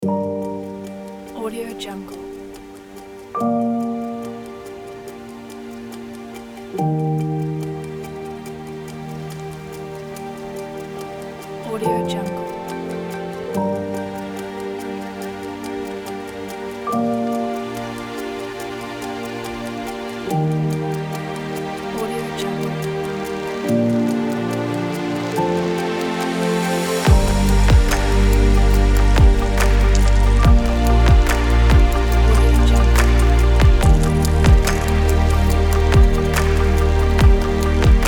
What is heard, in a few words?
abstract documentary background